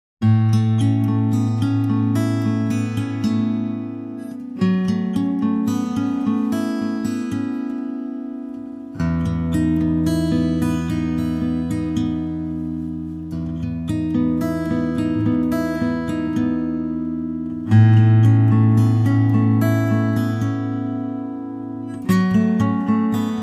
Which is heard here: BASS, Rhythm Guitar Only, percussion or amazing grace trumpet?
Rhythm Guitar Only